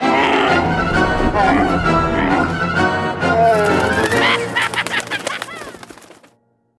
Failed Rescuing; Starfox Adventures; Failed Rescuing the Thorntails

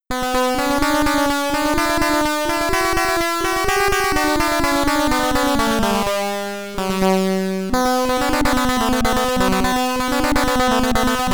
Stage SID Stereo; Gemini Wing; Commodore 64

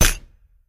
Reward Egg Tap; Angry Birds

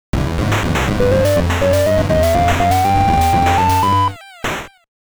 Map Completed; Fun House; NES

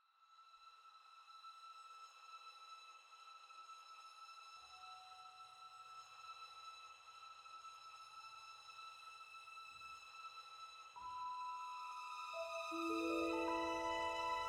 World Music

